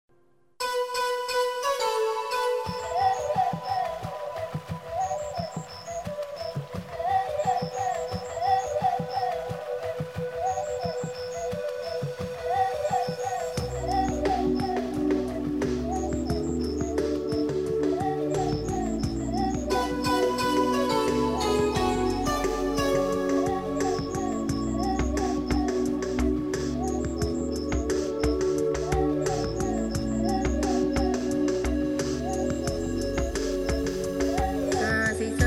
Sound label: sweet lullaby